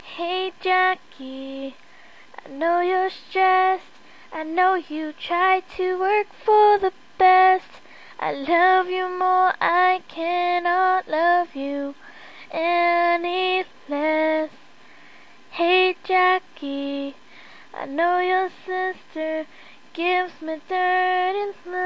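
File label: female vox; vocal acapellas; various acapellas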